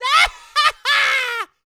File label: YELL, MALE HUMAN; ILIO; Vocal Planet